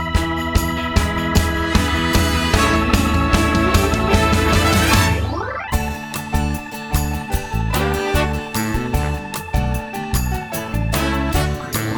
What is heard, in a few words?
instrumental